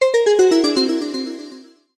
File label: game over